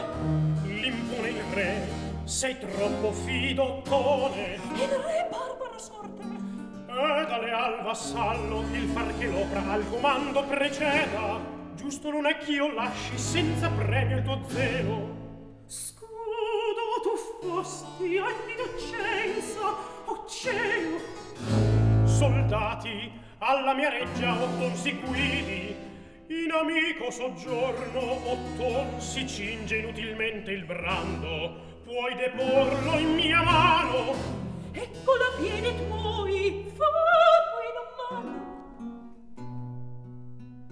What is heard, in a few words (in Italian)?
Recitativo; Atto II; Scene 13; (Gualtiero, Griselda, Ottone); L'impone il re. Sei troppo fido